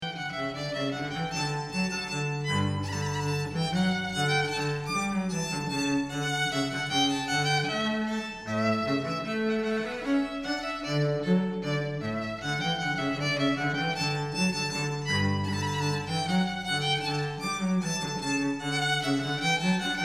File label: Rondeau string duo